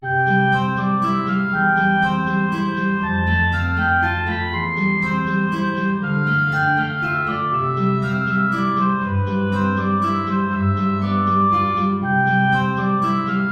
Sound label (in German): Menuet, Oboe & Gitarre; BWV Anh 114